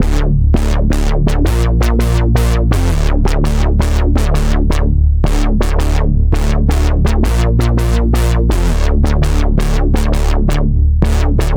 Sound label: Bass loop; Vostok Inc.